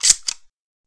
insert shell, weapons

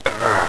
pain

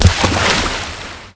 Water Splash

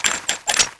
skull draw, weapons

